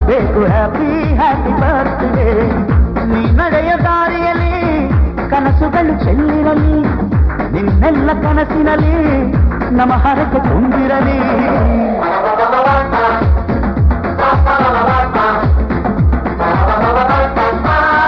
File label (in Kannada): happy happy birthday friends birthday song, kannada